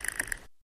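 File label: Motor Small Scream, variable speed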